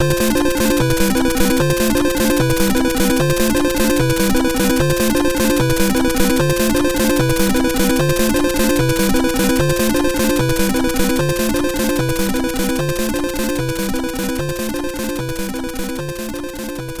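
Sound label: BGM Invincible Hurry; Super Mario Maker; Muteki Hurry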